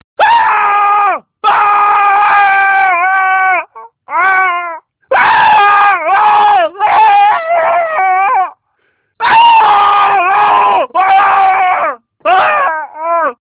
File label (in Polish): wrzaski